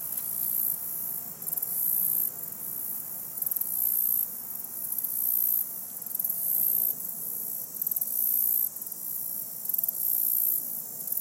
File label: Hopper